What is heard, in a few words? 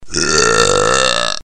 burp; classical